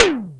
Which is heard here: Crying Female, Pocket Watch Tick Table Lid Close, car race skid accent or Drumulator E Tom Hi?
Drumulator E Tom Hi